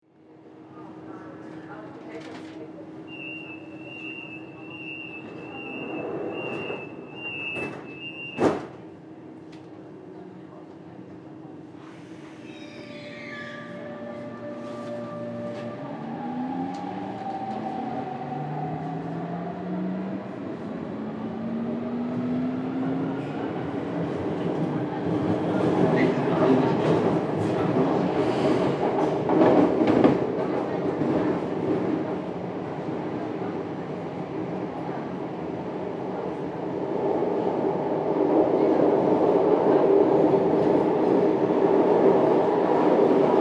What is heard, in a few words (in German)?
S Bahn innen